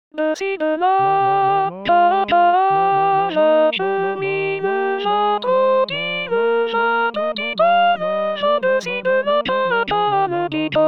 duo de l ane alti

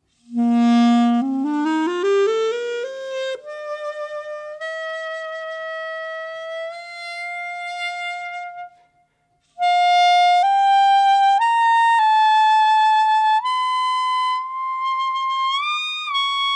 Clarinette Bb

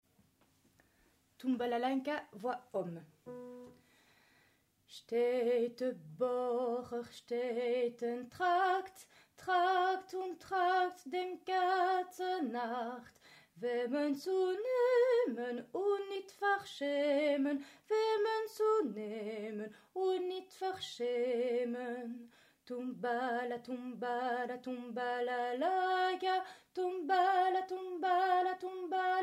Hommes; Tum Balalaika

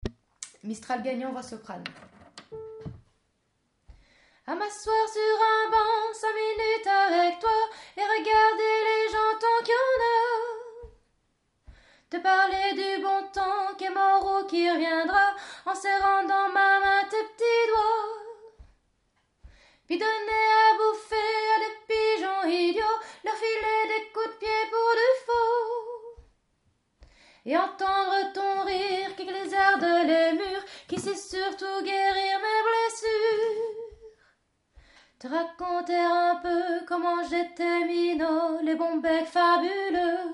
Soprane

